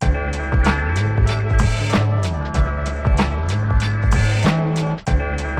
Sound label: 94BPM, Hip Hop; InVision Interactive; 40 Oz Phat Slammin